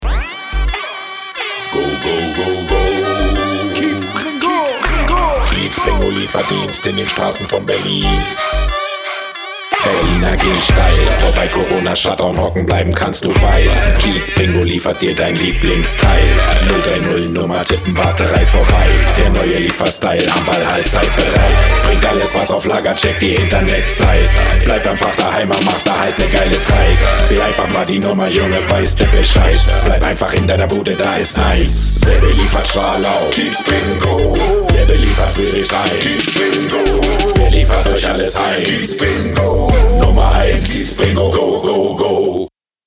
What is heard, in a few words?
JINGLE; KIEZBRINGO